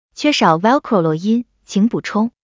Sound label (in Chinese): Velcro啰音; XinFeiTingZhen